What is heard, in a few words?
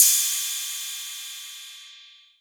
808 Cymbal; Novation Drumstation